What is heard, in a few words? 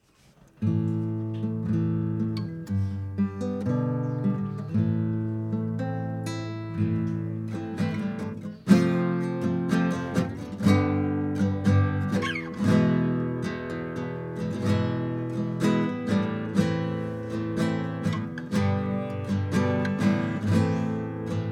bluegrass; field recordings